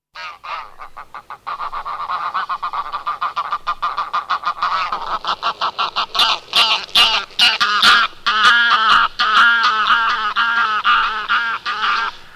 gęgawa - głos

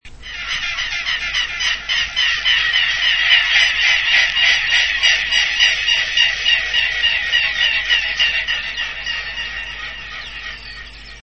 łabędź niemy - świst skrzydeł

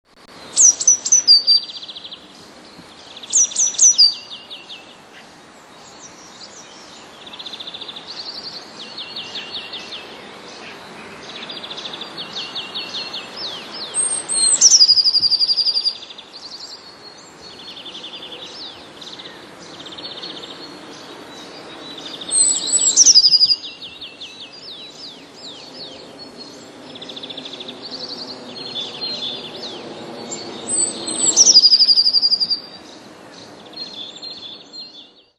modraszka; Modraszka należy do ptaków śpiewających

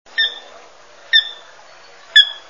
kureczka karolińska; Porzana carolina; ptak dotychczas w Polsce nieobserwowany

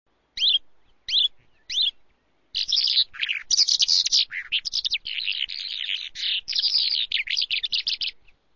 kalandra dwuplamista - Melanocorypha bimaculata; skowronki; wroblowe; dotychczas w Polsce nie obserwowana

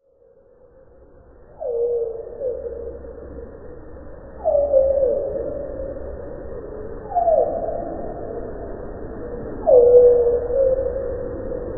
pierwiosnek, to samo nagranie w 8-krotnym zwolnieniu i obniżeniu o 3 oktawy; wroblowe; zapozyczenia

